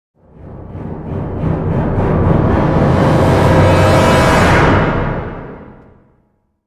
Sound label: music default ganked